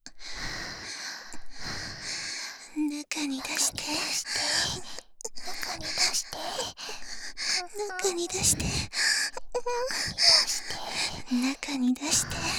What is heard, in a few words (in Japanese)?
喘ぎ; ハーレムオナサポ 4人のメイドがご主人様のオナニーをお手伝いするバイノーラル～文、千曜、名月、莉桜～